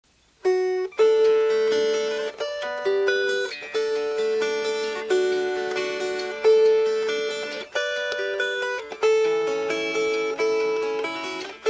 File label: Fingerpicked; Kind Sir